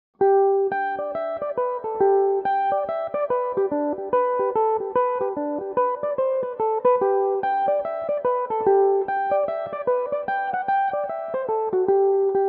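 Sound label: G major, irish mandolin, tunes; mountains of kerry; belmuse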